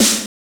normal hit clap

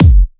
kick screaming

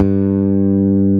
bass

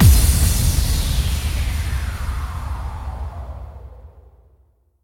fx kick

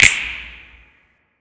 Finger Snapping

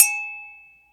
Glass Bell; FPC

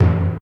march tin

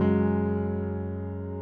piano low

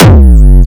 big kick

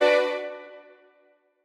Strings

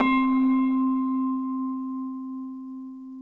Bell C4

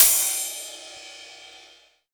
Ride

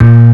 bass; osu

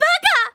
combo break

